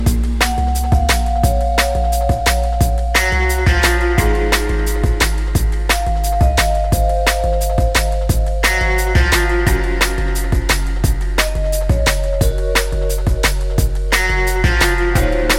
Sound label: guitar